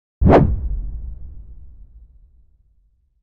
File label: sword swing; 攻撃